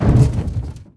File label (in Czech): nájezd; Mafie 1